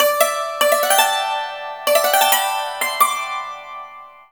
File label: YANG CHING, Heart of Asia; Spectrasonics